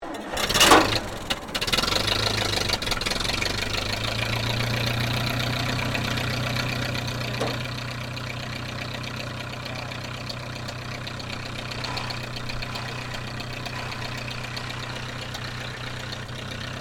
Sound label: Tractor Furguson 1956 startup idle, Old Farm Tractor; Tractors; Vehicles